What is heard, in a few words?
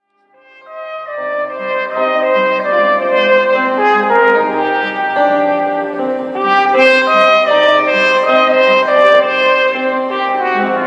Trompet